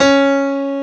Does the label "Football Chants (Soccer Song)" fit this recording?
No